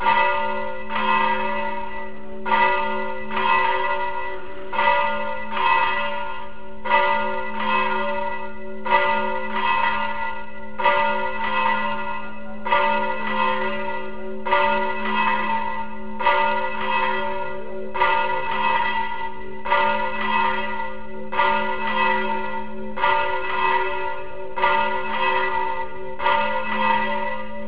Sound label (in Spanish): campana; San Fabian